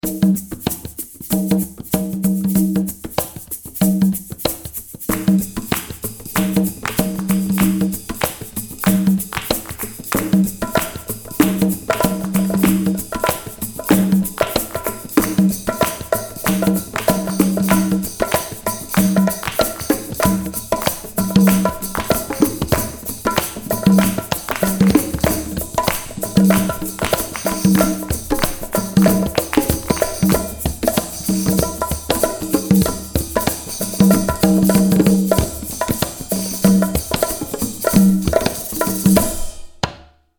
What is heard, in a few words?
Swing Conga Dance; Jane the Virgin; World, Vibey; Percussion, Latin